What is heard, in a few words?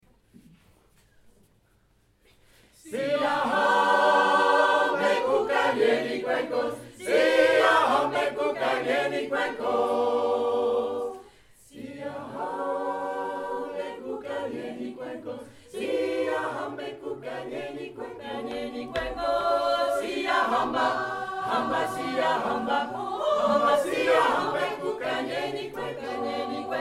Siyahamba